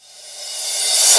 reverse; osu

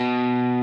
Arp.

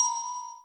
Bells

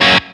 F# staccato; osu

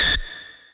lead a

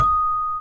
Celeste D#4

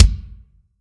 drum hit normal

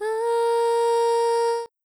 Choir; osu

